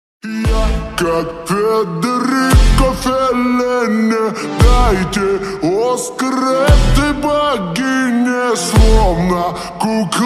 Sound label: reverb slowed